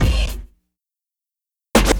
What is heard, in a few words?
Scratch Hit Loop; Electronic Point Blank